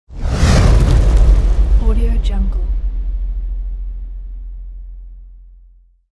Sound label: shining woosh; soundmasterfx